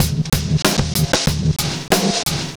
Mean Streets, Drum Loops, Compressed; Zero-G Smoke

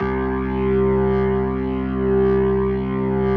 C2S, B3

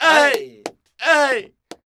VOC, Flamenco, male; Best Service ProSamples vol.46; AKAI

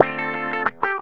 GTR, Funky; Zero-G; Funky Elements

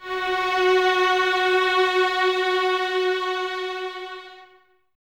VNS F#4, Violins; Miroslav Vitous; String Ensembles

